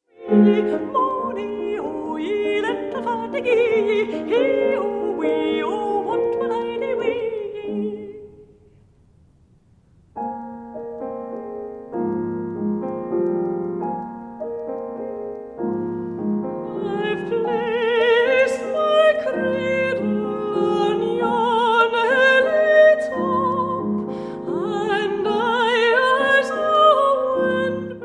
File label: folk song arrangements british isles for voice and piano